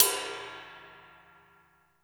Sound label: Ride, Cymbals; Vengeance Samples